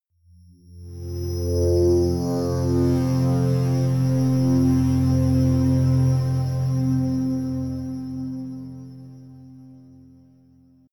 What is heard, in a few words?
scrapes ringing blitter harmonic